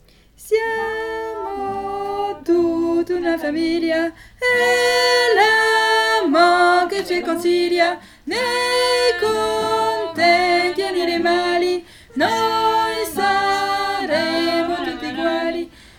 Liberte SOPRANE; St Jean

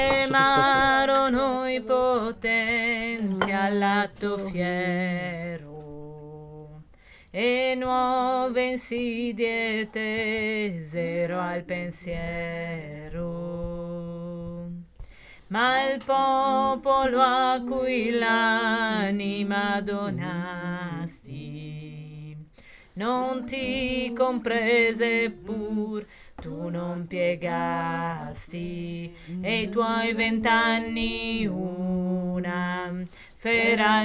3e voix, Chansons; Canto a caserio; Sante caserio

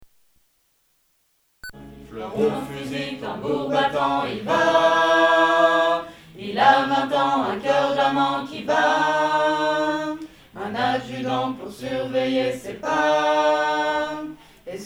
aigues

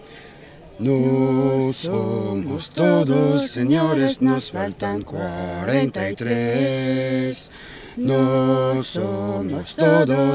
voix

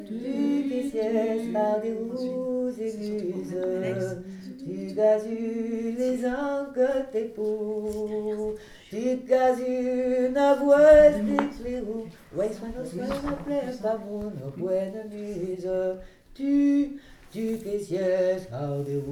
LES 3 VOIX

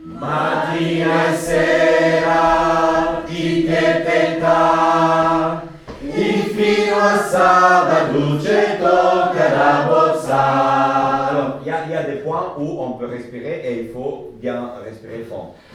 contralti et tenors; Cinturini; St Jean

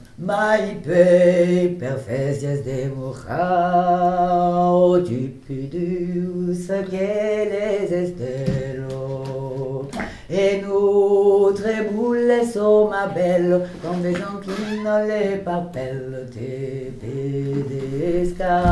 VOIX 2 BASSE

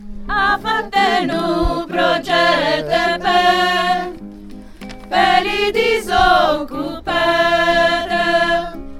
sopranes couplet; Ganges

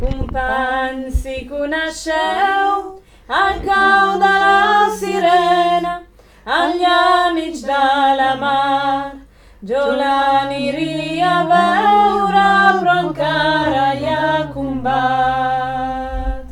couplet sopranes

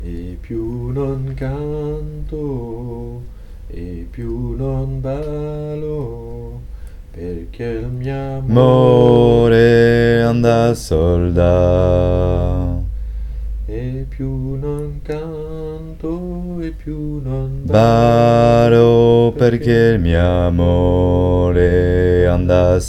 voix basse

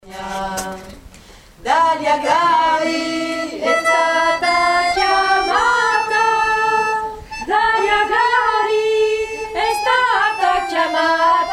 e partita soprane, voix

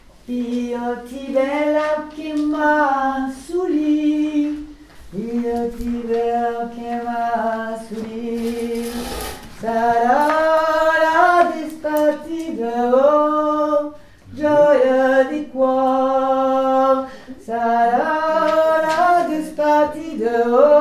VOIX; La Despartida

